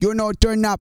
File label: REGGAE, Vocal Planet World Voices; ILIO; Vocal Planet VOL-5